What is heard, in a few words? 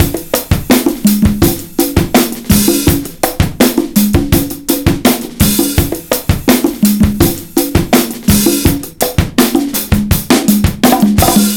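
drum, Breakbeat; RARE GROOV 083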